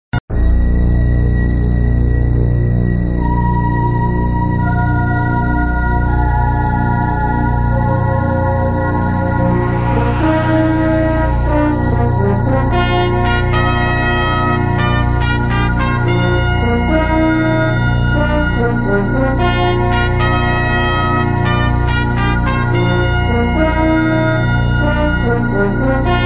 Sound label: Music, flipper